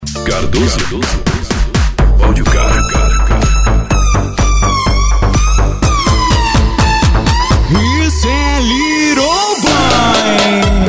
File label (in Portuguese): eletrofunk com piseiro; cardoso audio car